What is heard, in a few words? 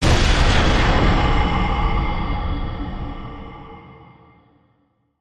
Hollow Boomer Impact